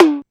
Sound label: Tom, LinnDrum